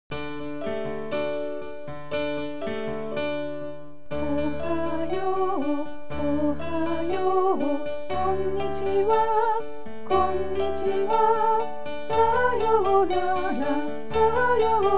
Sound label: Greeting Song